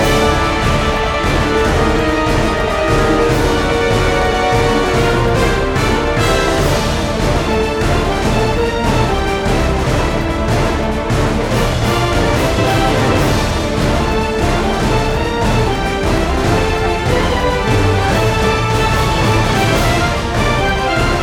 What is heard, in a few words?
Dramatic loop; PeriTune Material